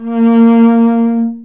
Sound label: STR, Cb Bowed, mf vb; Roland L-CD702